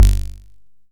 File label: dry kicks; Quasimidi Rave-o-lution 309